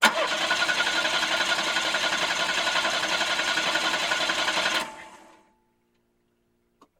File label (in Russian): zvuki startera avtomobilia